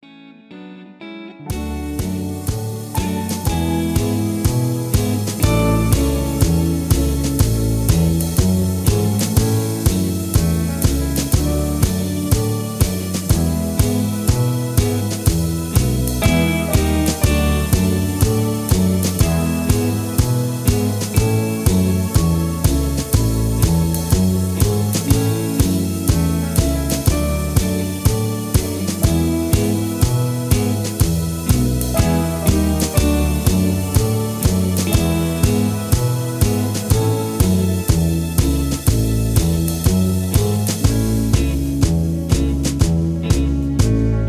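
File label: Instrumental with background vocals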